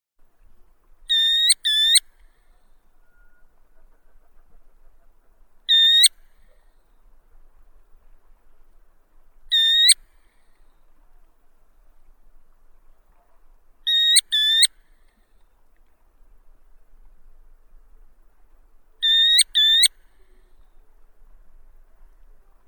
pesochnik berdov calidris bairdii

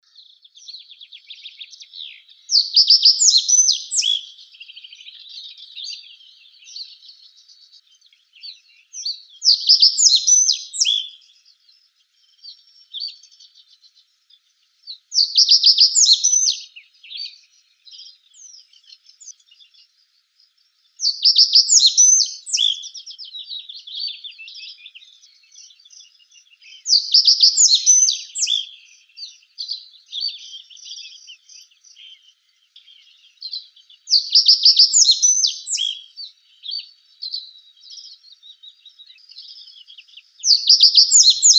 ovsyanka kamishovaya emberiza schoeniclus

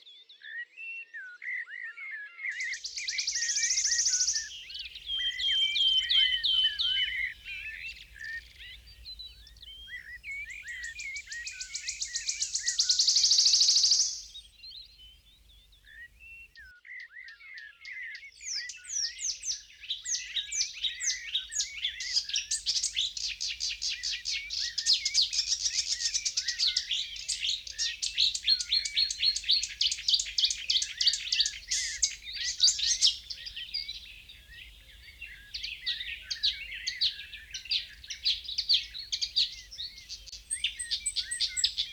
penochka treshotka

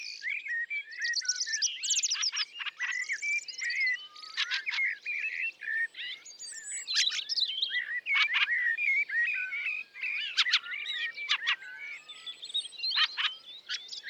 drozd ryabinnik

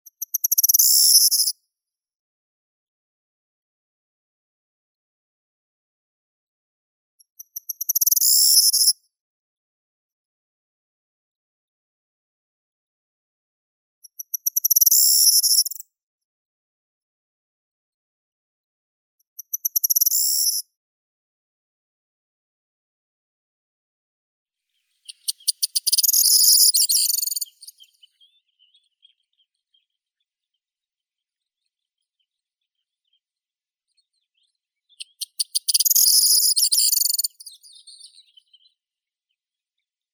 prosyanka miliaria calandra, zvuki prirodi

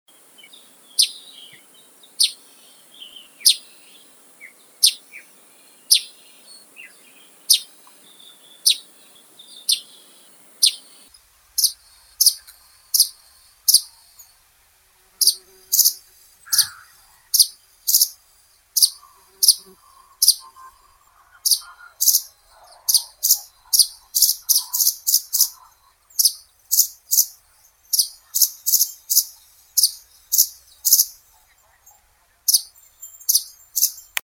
vorobei rizhii passer rutilans, zvuki prirodi

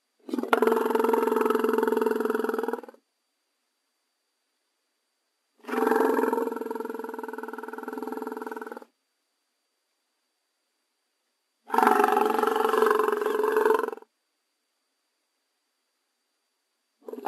zubr bison bonasus; zvuki prirodi

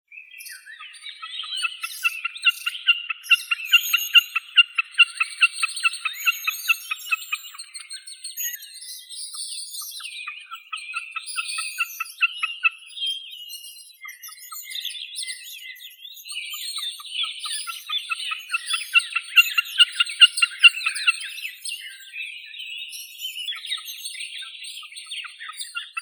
bekas gallinago gallinago

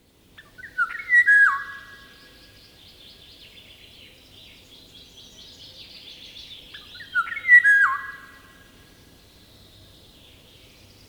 zvuki prirodi tiho plachet ivolga

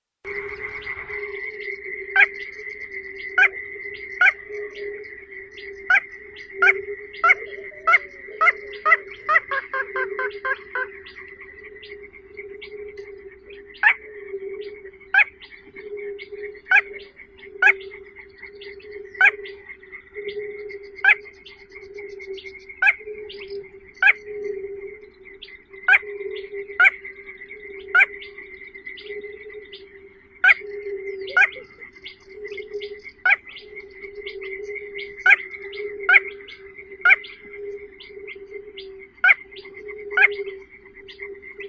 pogonish malii porzana parva; zvuki prirodi

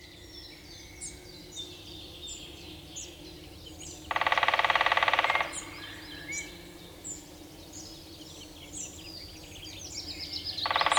vesnoi v lesu, zvuki prirodi

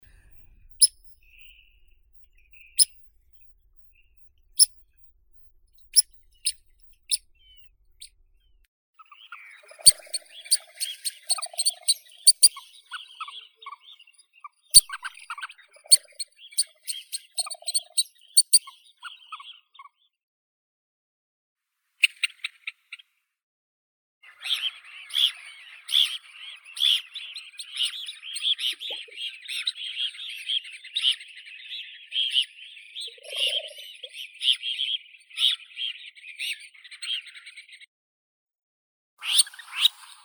plavunchik ploskonosii phalaropus fulicarius; zvuki prirodi

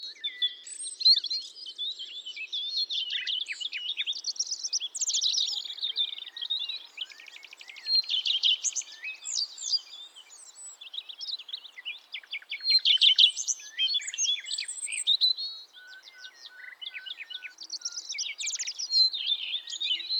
sadovaya gorihvostka, zvuki prirodi

